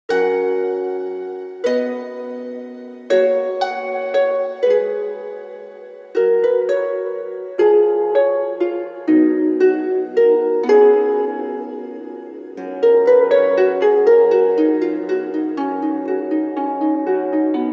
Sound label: Heavens Morning Harp, ringtone; Pantech Discover P9090; sky ringtone